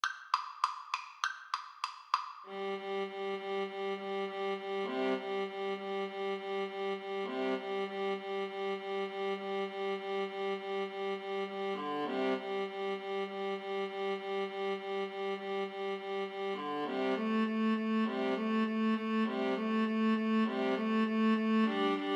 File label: viola duet